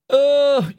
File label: ooooooo